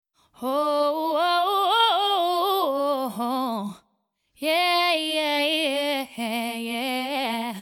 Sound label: Softube Trident A-Range vocal